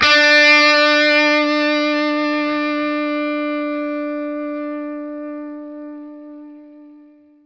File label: SINGLE D, Vintage Blues Guitar, ELEC.GTR; AKAI; USB Soundscan vol.22